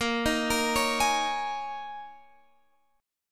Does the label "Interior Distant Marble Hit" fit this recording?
No